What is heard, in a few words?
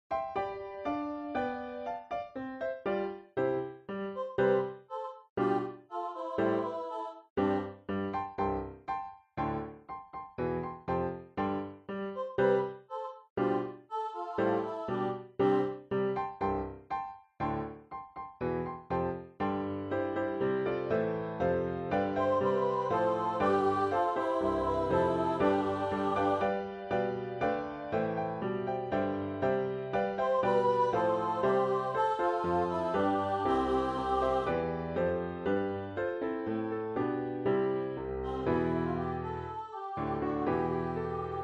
piano; A Mighty Fortress